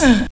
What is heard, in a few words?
VOCALS; Ueberschall; Jam Box